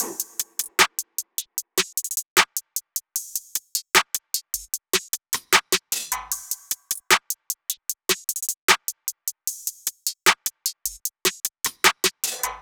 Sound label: Drum Loop - 152 BPM; Make You Mine; Cymatics; Mystery Pack Vol 4